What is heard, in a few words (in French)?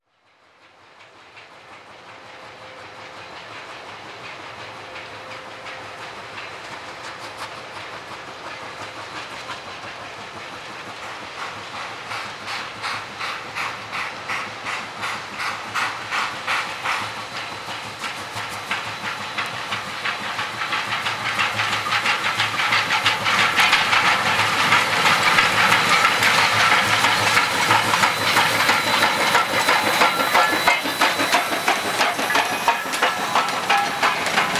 bruit joints de rails; sonotheque